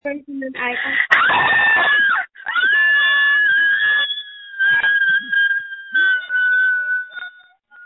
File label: screams